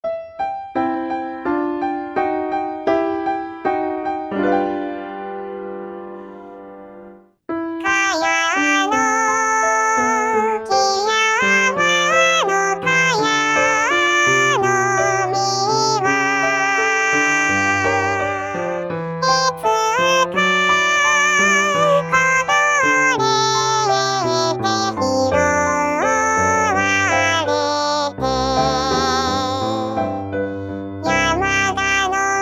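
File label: female soprano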